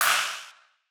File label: Wider Clap; stereo toolkit